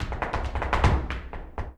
Flamenco, Tapping, Zapateado Solo

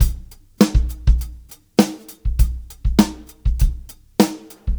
Live Beats, R&B Drums

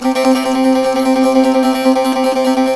SAZ; Spectrasonics; Hans Zimmer Guitars VOL-2

